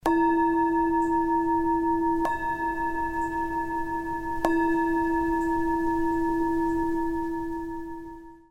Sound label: Gong A4